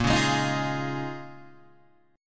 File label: Bb Minor 11th Bb down Guitar Open G